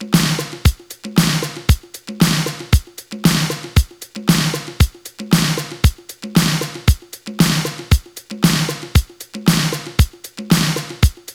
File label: Fresh loop